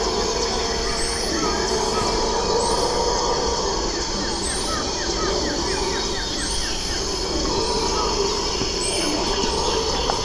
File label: ceibo dawn sounds from treetop